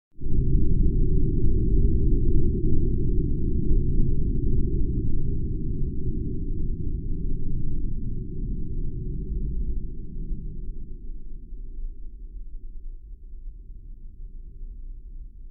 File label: Impact Underwater Bass Hit - Long; Hollywood Edge; sound designer's toolkit v2